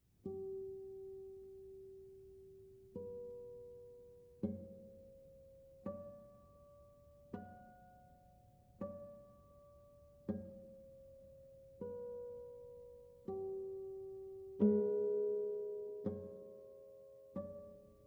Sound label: Microtonal Studies